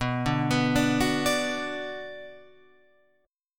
B-Minor, Guitar, Open F; arpeggiated; JGuitar

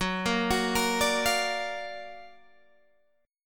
Gb Augmented Major 7th Gb down Guitar Open G

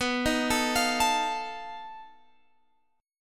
B 7th Flat 5th B Guitar Open G

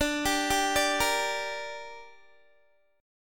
D Suspended 4th Sharp 5th, Guitar; arpeggiated

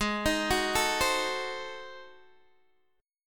Ab 7th Flat 9th down Guitar Open G

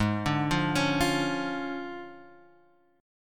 D Minor Major 7th Flat 5th, Ab

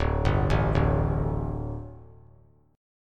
D Suspended 4th D; arpeggiated

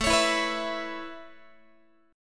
A-Major Add 9th Guitar, Aadd9 chord; Aadd9 strummed; JGuitar

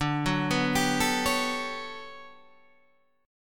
G Major Sharp 11th D, chord

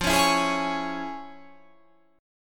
F Minor Major 7th Sharp 5th

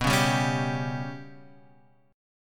B Suspended 2nd Sharp 5th

